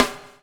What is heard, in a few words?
BUBL, BUBBLE; Spectrasonics - Backbeat